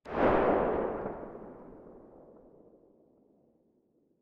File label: muzzle mono far, weapons